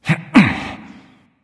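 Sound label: nonverbal grunt lift; smite; voicepack; ullr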